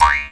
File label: D JAW, Primitive Instruments